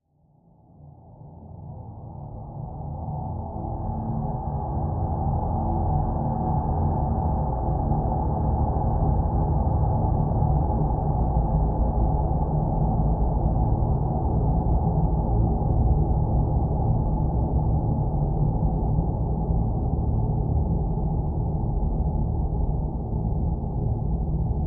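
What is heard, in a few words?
Drn Dimensional Simple D, drone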